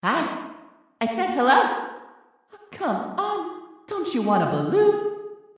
Baloon

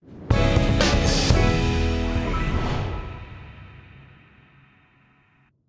Completed; Rocksmith

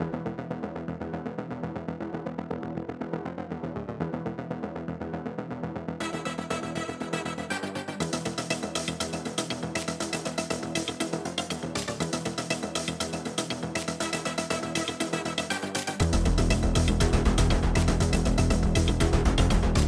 Dramatic Background Theme (Looped)

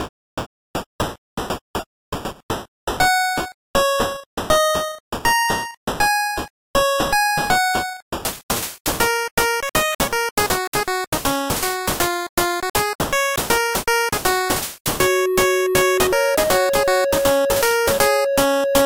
subgame dance song rehearsal

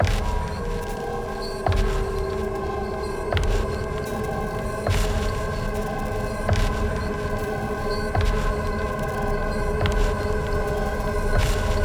rune 148bpm loop

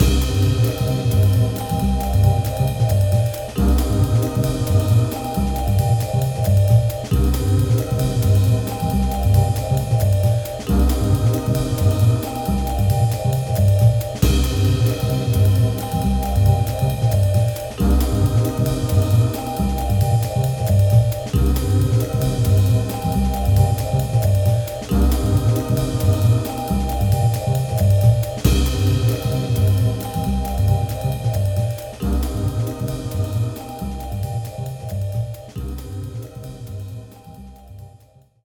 Music Game General event

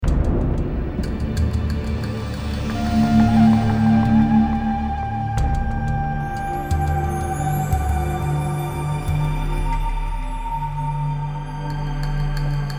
Music Explore

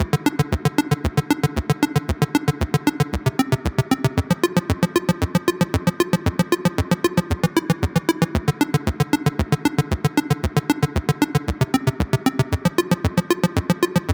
Loop - Start & Variation; The Adventures of Elena Temple

